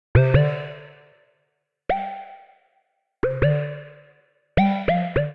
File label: Lead D Minor 154 bpm; MIDI Clips; Skitter and Step; Drifting Back